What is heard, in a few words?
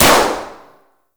Balloon Pop; Ambience; Gameplay; Warframe; Nineteen Ninety Nine